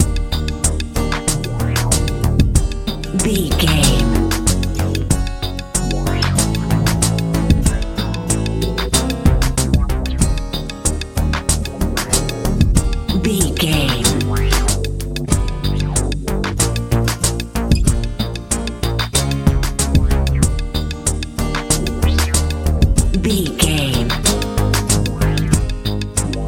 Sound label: pop dance